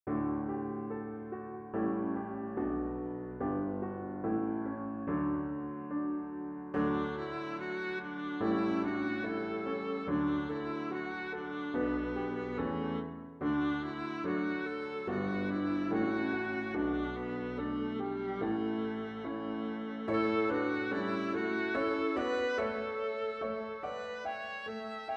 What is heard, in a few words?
Easy Viola